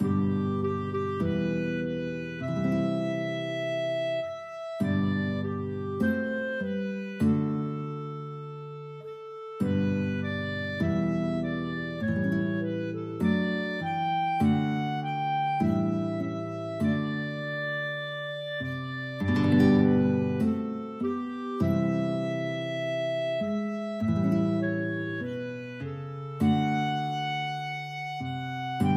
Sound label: guitar clarinet duet